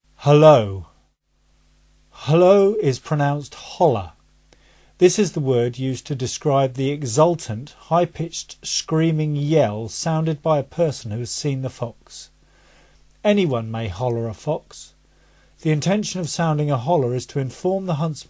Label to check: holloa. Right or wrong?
Right